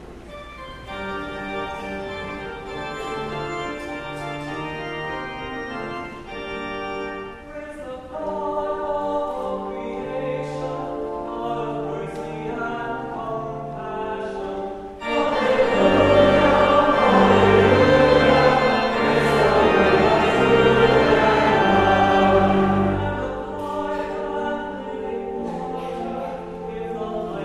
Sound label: Gospel Acclamation